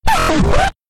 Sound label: scratch